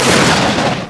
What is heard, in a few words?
BOOM; CYBERSPD